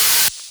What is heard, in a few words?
Open HH, Cymbals